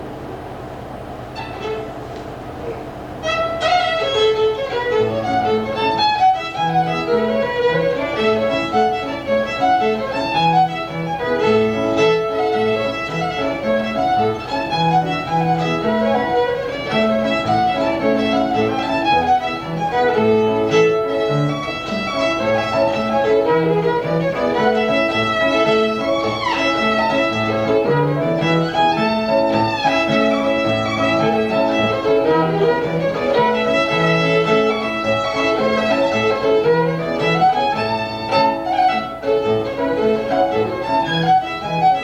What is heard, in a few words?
Jig In A